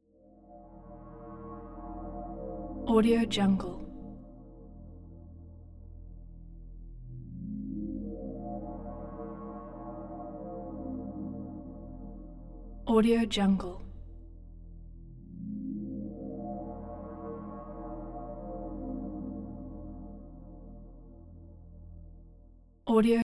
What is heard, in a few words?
sci-fi space bio core alien base